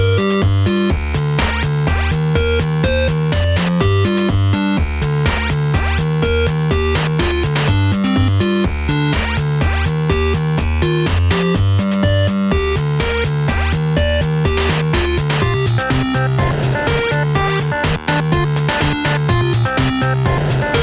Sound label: Grand Music 8-Bit